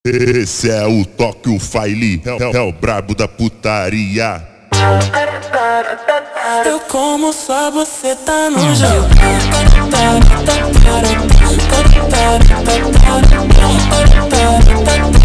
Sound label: Funk slowed